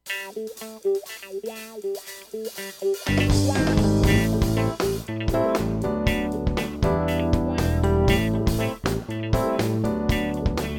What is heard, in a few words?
Funky